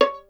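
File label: STR violin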